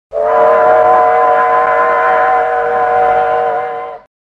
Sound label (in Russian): zvuk gudka poezda